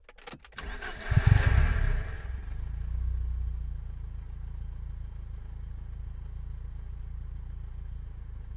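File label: engine idle start; Blind Drive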